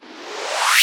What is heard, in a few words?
Misc Short FX